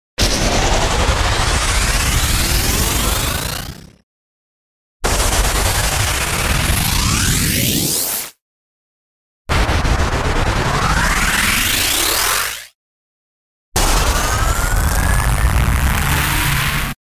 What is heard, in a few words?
Accelerators; Epic Tools vol. 1